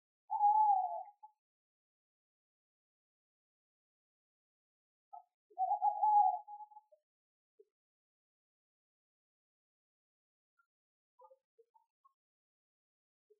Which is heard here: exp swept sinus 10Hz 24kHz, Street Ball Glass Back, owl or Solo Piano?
owl